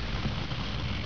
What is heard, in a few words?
Pencil; Kid Pix Demo